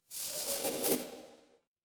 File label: magic scifi spell